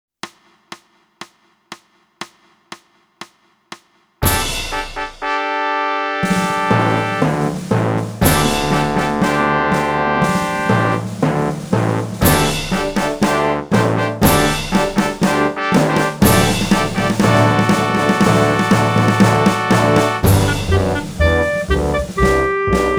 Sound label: clarinet; boosey method